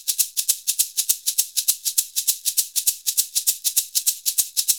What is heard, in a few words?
SHK, Shaker; Univers Sons; Basicussions